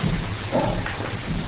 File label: bark